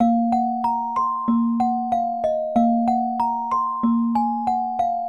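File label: bgm music box; ゆめ2っき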